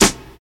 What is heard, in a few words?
studio snare drum g key, acoustic snare sound tuned to the G note; snare drum samples